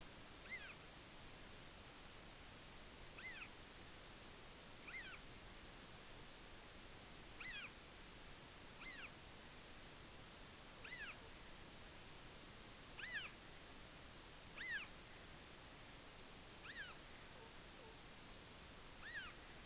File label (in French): Crave à bec rouge; Aspe